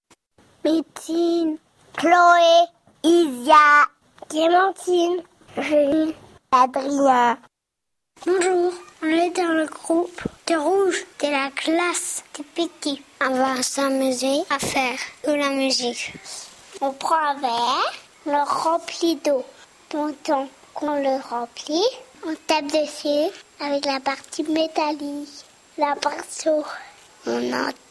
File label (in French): musique eau; le launay